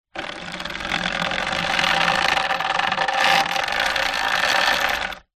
industrial chain metal medium move over wood rattle